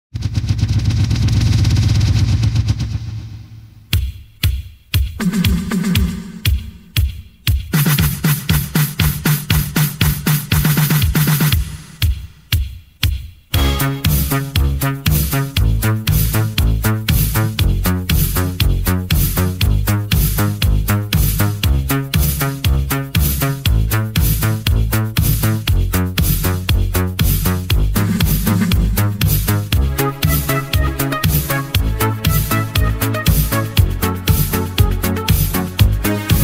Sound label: AI euro disco